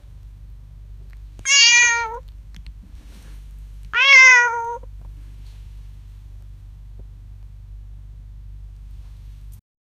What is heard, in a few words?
adorable tiny kitten meow; cats